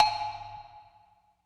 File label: Bell Lo Verb